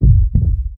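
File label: heartbeat, EKG sound; Total Control - Action Cutz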